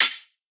Hihat, Dubstep; Ghosthack

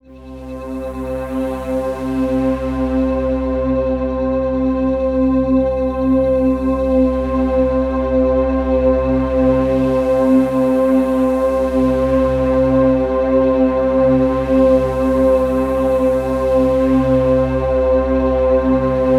Atmosphere

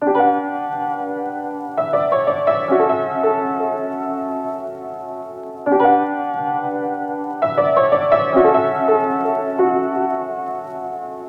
Lofi Melody Loop - 85 BPM B Min Piano; Melodics; Loops; Loop Stems; Cymatics; Eternity Sample Pack

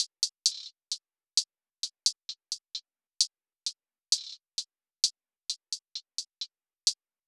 Drum Loop, 131 BPM Hihat; Loop Stems; Cymatics; Vibes II Drum Loop Collection; Temptation

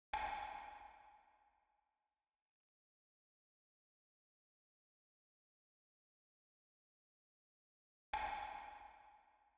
Drum Loop, 120 BPM Percussion; Cymatics; Vibes II Drum Loop Collection; Bright Drum Loop

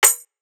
Percussion, Trap Drums; One Shots; GhostHack; Drum Mega Bundle Demo; Achieve

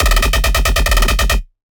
Dirty Bass D# 140; One-Shots; Bass Hits (Dirty); Ghosthack - Color Bass Essentials

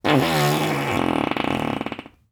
fart

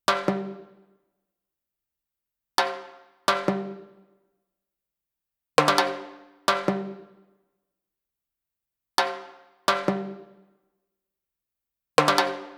Rims - 150BPM; Percussion Kits; STEMS; Ghosthack; SkyRealm; Kit 02 - No Where To Hide

